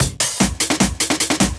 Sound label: Drum Fill 150; Trap and Dubstep